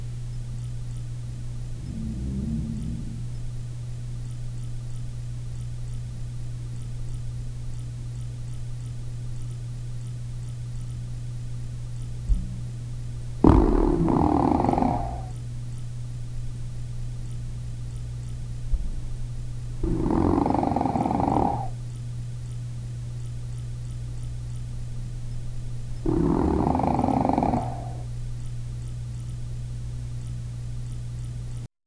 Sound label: the sound of an animal, reverberant